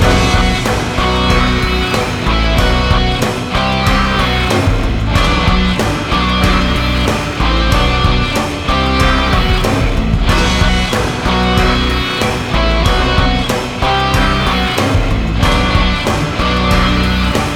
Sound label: theme, music combat